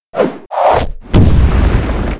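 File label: Mob raid skill; Monster; 레이드; 야투공야손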